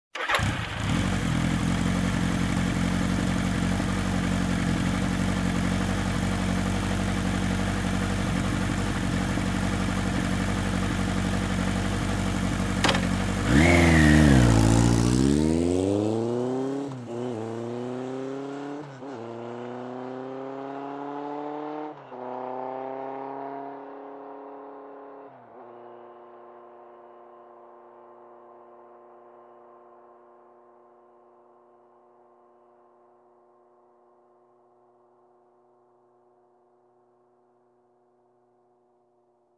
Sportbike engine ignition and driving away

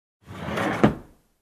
Wooden Drawer open

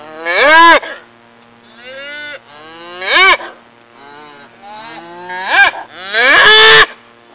Calves mooing

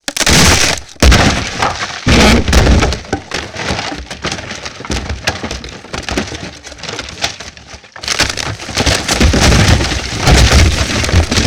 Shattering Woods

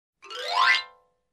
Scale up fast on xylophone

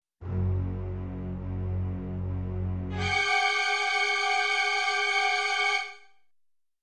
Vintage horror movie orchestra tension

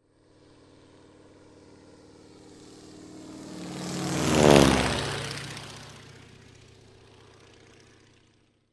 Light Aircraft on a runway whirring sound

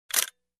Vintage Camera Shutter Sound

